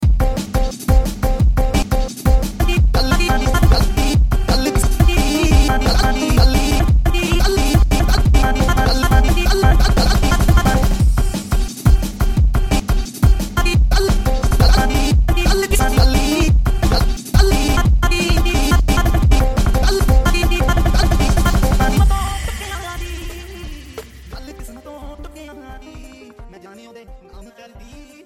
bass ringtone

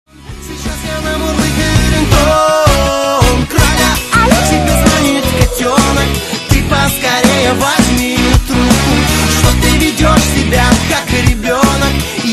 russian

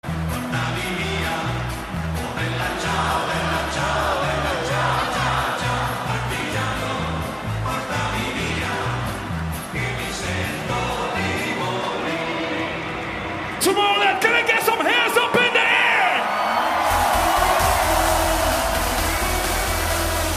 trance; tomorrowland

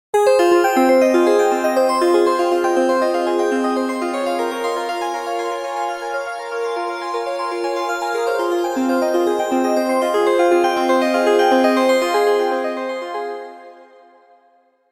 cool synth; samsung galaxy s11

